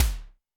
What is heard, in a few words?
Kik Lo Wisp, Alesis D4, Drum Machines